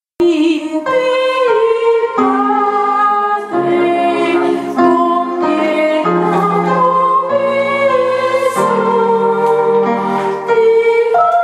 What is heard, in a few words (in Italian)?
inno corale soprani